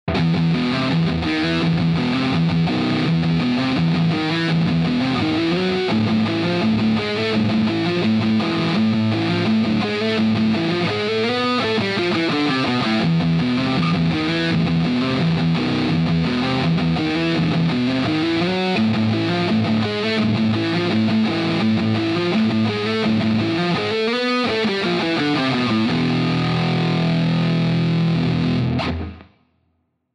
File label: riff